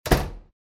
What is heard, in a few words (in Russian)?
eshhe hlopok